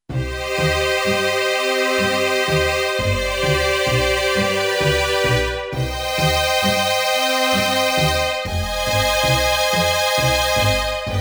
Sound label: Bwd Strings